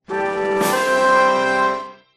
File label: winner; Minecraft